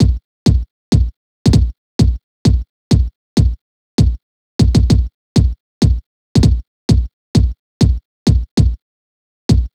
Kick; Hip Hop Exotica; big fish audio; Apple Loops; 098 Bmin; Drum Tracks

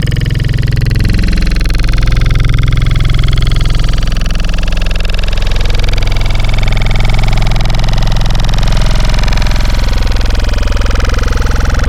Growl Wavetables; Dub Chodes; Cymatics; SERUM; Virus Wavetables

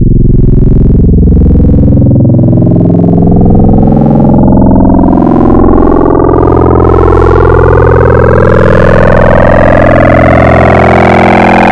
Cockroach, Wavetables; Cymatics; Essentials Wavetables; Serum

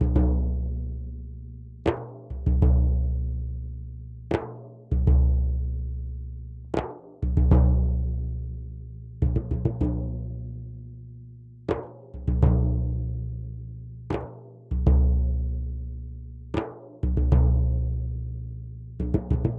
duff 098, Hip Hop India, 098bpm

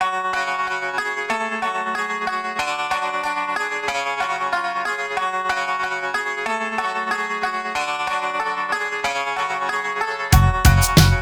093 F#min, Hip Hop; Hip Hop Exotica; Apple Loops; Big Fish Audio

